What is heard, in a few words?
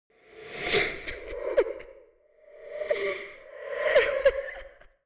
Female crying ghostly